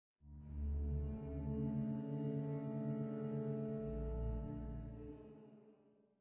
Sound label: Howling; Lethal Company